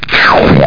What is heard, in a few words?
sink